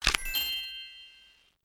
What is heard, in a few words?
card to foundation